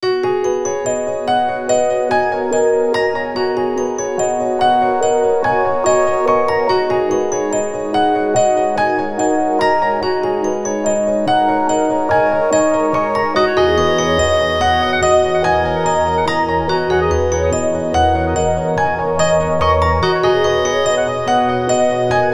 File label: Peace Alarm, phonetones; PlusFourSix; Rika (W705); Lars Edvall